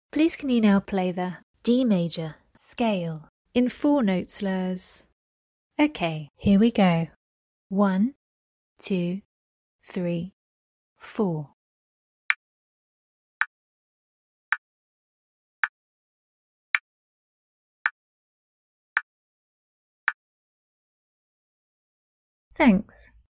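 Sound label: D major scale 4 note slurs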